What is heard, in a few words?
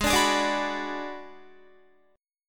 Ab Minor 7th Flat 5th Ab, Guitar; Abm7b5; strummed